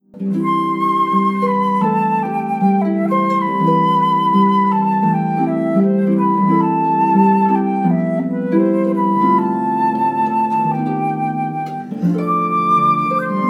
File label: Flute Harp